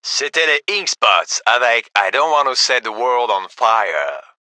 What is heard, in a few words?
music